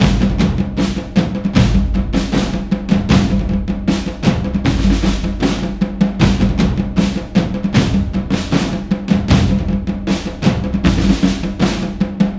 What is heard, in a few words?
Drums; For Honor